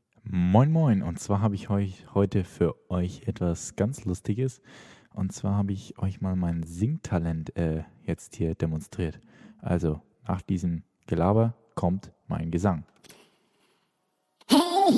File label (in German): ich beim singen